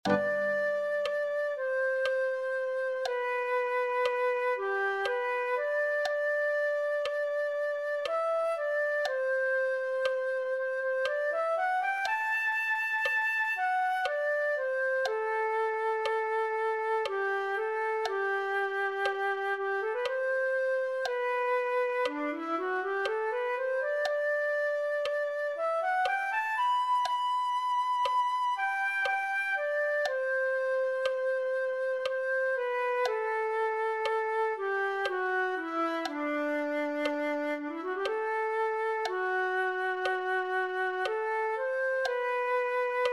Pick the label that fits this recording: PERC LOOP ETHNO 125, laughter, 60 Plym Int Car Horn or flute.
flute